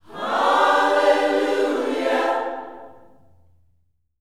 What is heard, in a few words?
HALLELU, Gospel; Spectrasonics; Vocal Planet Vol.1 Gospel; HALLELUJAH